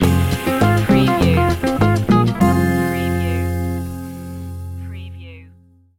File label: cumbia libre stinger